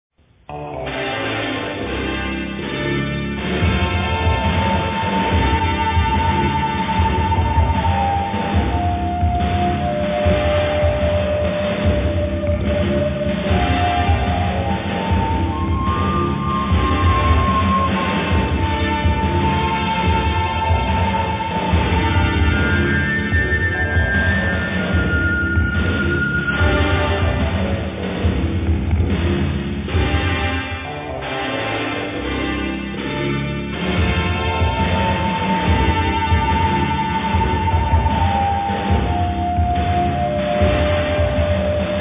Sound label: Funk